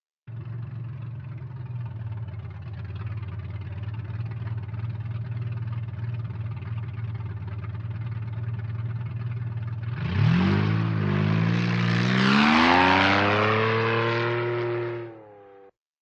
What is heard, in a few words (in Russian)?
rabotaet dvigatel baggi stoit i otezd